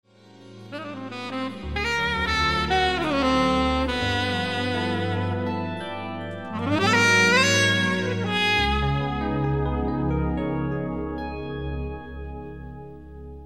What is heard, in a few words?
Samba